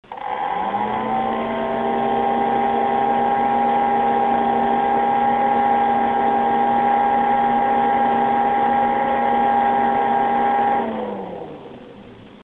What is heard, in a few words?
cooler master, low